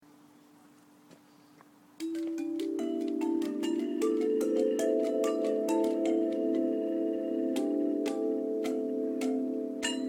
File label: tree gongs